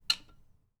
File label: metal click; breakout style game